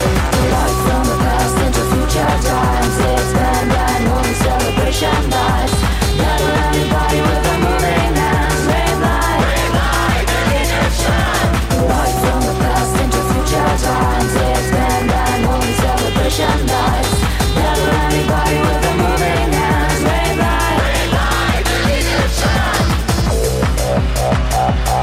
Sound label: Egyptian Rave